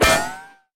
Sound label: bullet, ricochet; Weapons